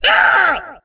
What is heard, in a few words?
VOICE SCREAM; cs2d; cityrp; pedestrian